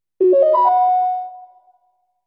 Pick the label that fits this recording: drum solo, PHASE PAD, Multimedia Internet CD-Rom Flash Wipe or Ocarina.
Ocarina